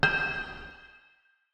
Metal Pipe On Concrete

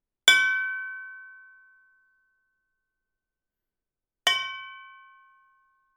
Anvil

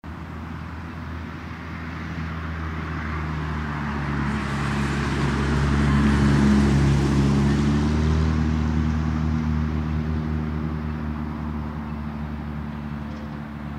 Cars

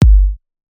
Kick Style Kick Drum

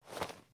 Leather Rustle

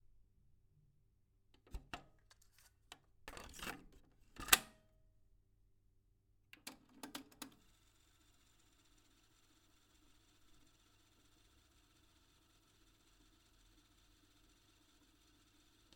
Cassette Tape Insert Rewind

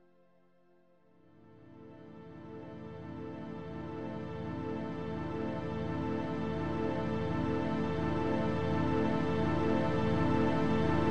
Strings Aminor

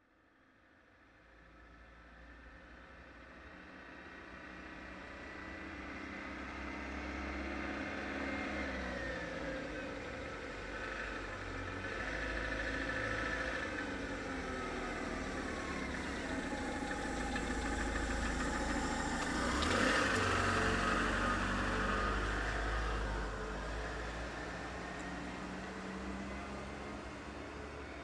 Electric Car Approaches And Moves Past

